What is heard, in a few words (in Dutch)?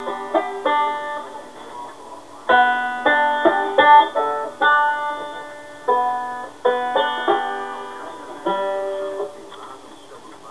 speelt banjo; Hildo